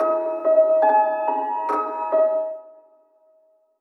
piano, Sad Loops

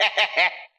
laugh slightly evil; ooh ahh

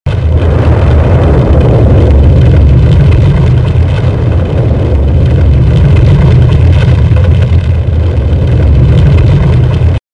volcano, Rumbles

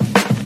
clapping; Drum loops

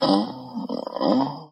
"oh", orgasm; ooh ahh

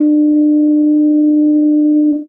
blown, Horns

